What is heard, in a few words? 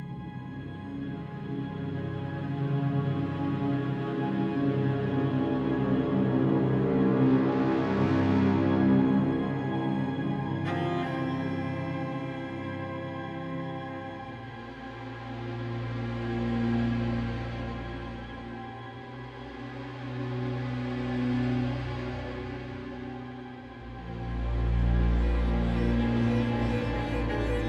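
alert orchestra; the walking dead survival instinct gamerip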